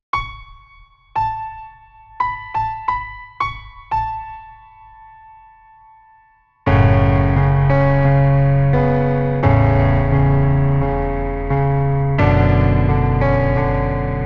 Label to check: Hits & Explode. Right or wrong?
Wrong